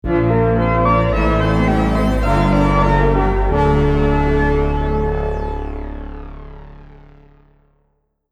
Item Fanfare; Super Metroid Resynthesized Vol I

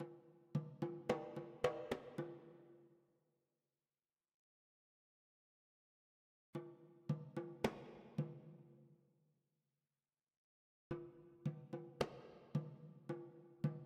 Drums Mid; Frozen Flame; windows

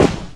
Feet; Peace, Death; Purgatory

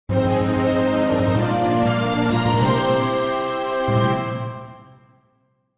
Jingle Victory

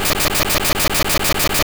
Horn Drill Loop

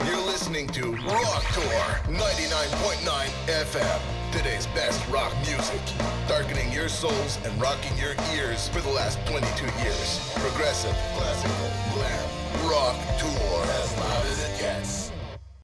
Radio Rock Jingle; Gangstar Miami Vindication; android; macos

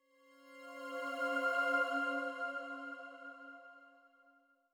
Calm Pad

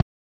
Goalie Kicks Ball

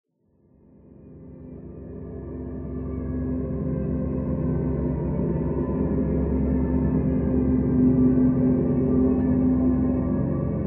Library Main Pad

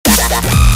Dubstep

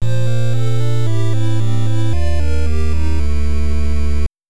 Item Acquisition Fanfare (Stereo)

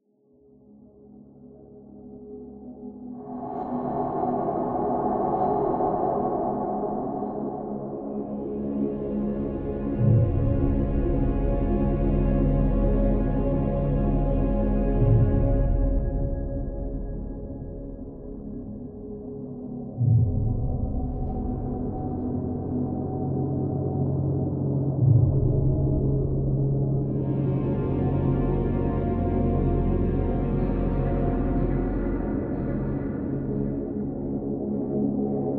Calm Morning

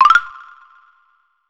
Pick Up Piece; Bottles’ Challenge

